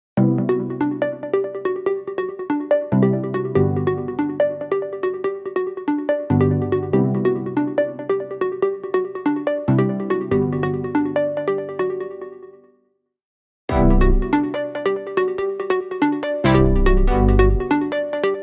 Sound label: Siren Remix(142)